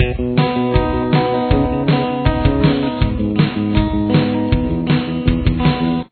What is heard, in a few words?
pre-chorus guitar